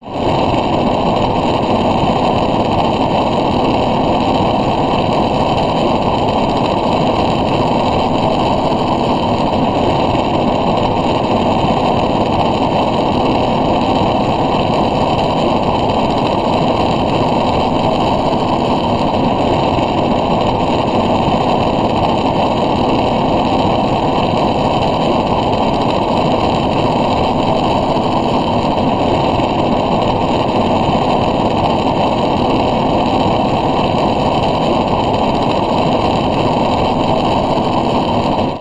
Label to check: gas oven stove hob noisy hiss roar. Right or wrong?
Right